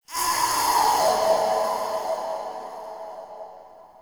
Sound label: Interior Texture High Vain Squeeze, Infested, Ambience; Misc Indoor